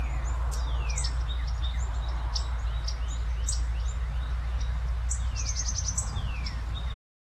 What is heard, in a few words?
woodpeckers